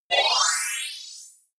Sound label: appear sparkle short